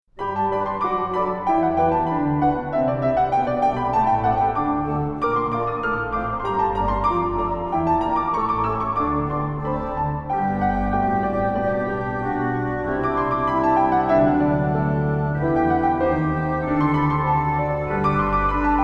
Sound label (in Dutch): Kerstfantasie voor orgel en vleugel